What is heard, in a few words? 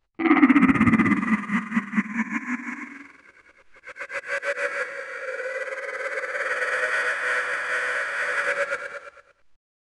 a slow glitchy menacing, bitcrushed